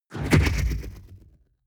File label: sci-fi wobble explosion